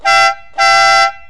motorbike horn

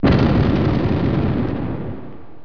mortar cannon, explosion sounds

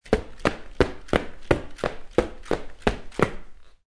Footsteps Running On Concrete Floor

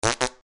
Quick sudden fart

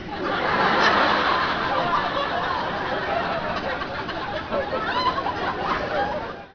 people laugh

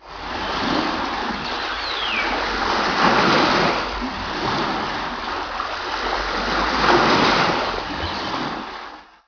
ocean sgull, ocean sounds

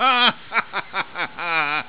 laughing man

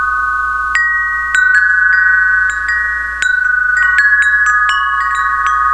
Soprano, windchimes

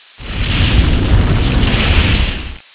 snow aval, christmas sounds

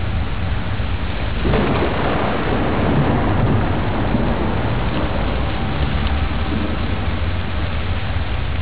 thunder and rain loop

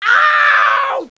Ouche, scream

